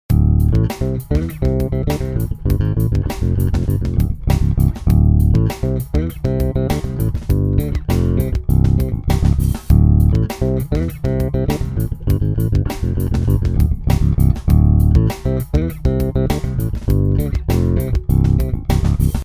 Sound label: octamizer